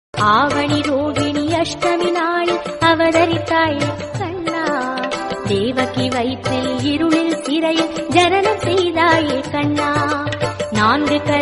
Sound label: bhakti telugu